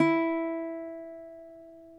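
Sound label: reference pitch uke bari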